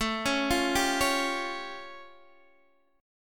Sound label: A Augmented 7th, Guitar DADGAD; chord